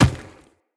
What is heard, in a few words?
Infested Medium Run Dirt, Footsteps